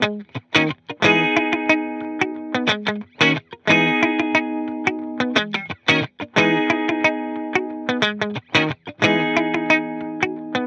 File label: guitar loop 90 Abm; Pop; Guitar Stacks; SD PASADENA Pop Sample Pack; Slate